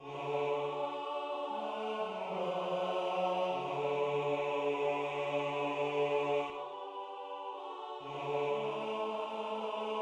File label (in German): Bass; Im Wald (Mendelssohn)